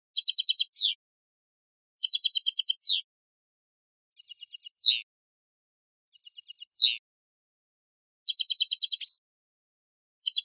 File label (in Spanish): repertorio chacheada, campero